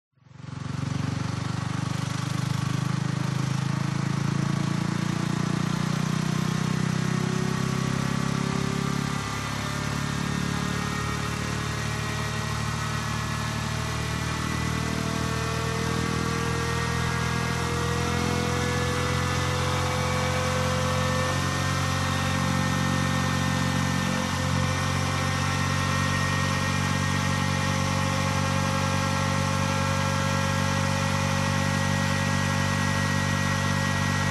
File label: MOTORCYCLE Engine Accelerate Slow Long; TRANSPORTATION; smartsound